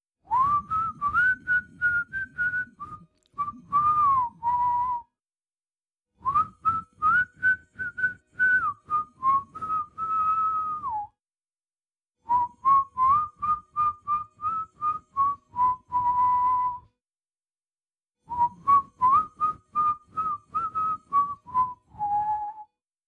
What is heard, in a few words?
dry whistle solo; Skin deep